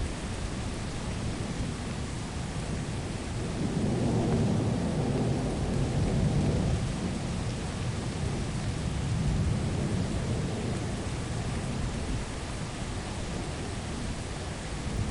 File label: stormy rain; Lethal Company